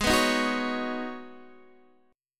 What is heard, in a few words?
Ab Minor 11th, Guitar, Open D